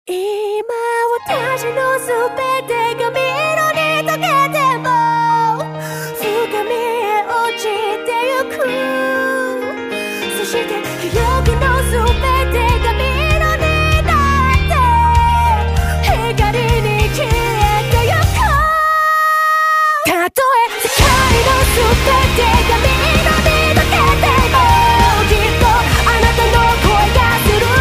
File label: Battle BGM